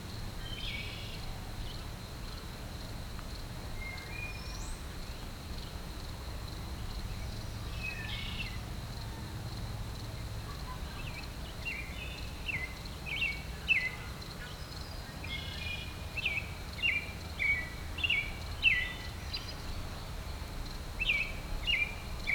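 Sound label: Meadow BG Birds Distant; Poetry Project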